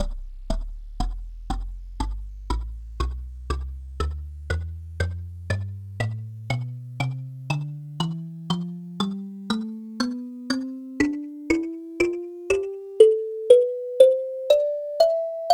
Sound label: kalimba tonleiter